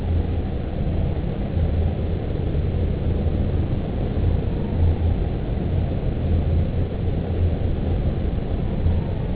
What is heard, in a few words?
silver amb